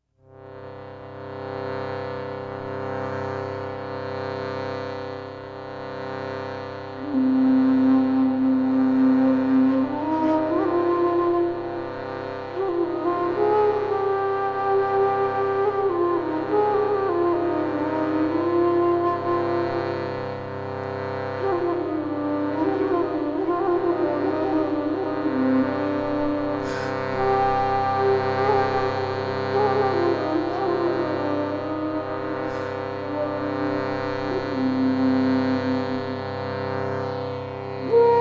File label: Bansuri Shruti